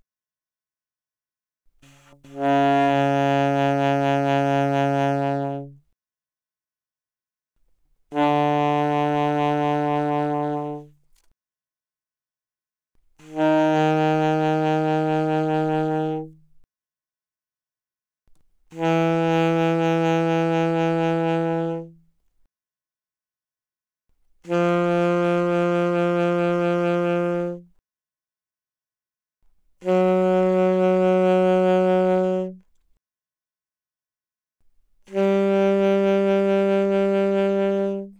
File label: Alto Sax Vib mf Db3 B3, alto saxophone